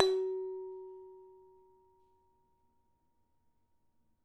bellplate 10mm; bellplates

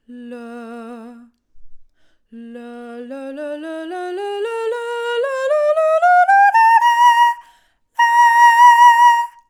ler scale, singers

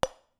bellplate corner; bellplates

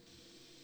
clarinet articulation, extra pop

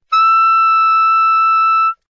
F sharp 6, soprano saxophone

